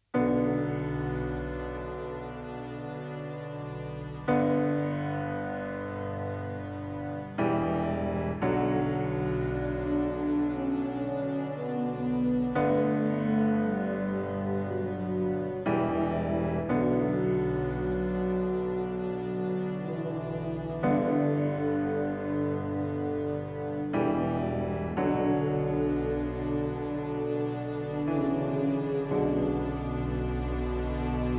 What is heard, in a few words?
Painful victory; musica para cine